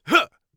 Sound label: lightattack, Warrior Voice; Warrior Voice Pack